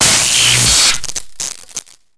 Alarm breaks, bunker

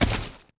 bodyfall mud, foley

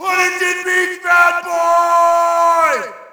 Shout, audience; UFC Tapout 2

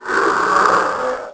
moan

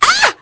dead wom, female; voices; Pirates Of The Caribbean

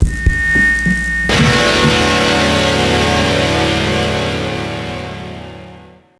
guitar noise; Max Payne; ragnarock

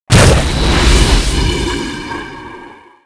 impact; Quantum Redshift

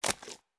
FS Mud, footsteps

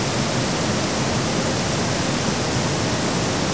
river, chicago

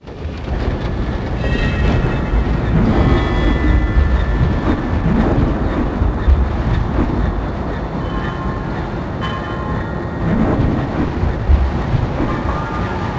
debris plummeting; Max Payne; penthouse

